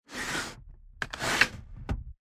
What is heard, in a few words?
wooden sliding door